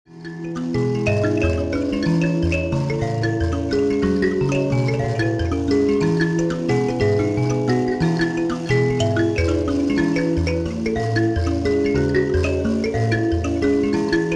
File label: mbira